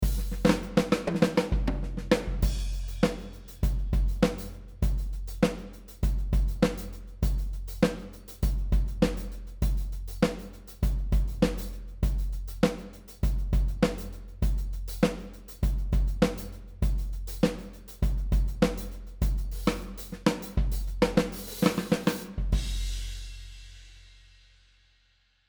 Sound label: Ludwig Concert Kit; Superior Drummer 3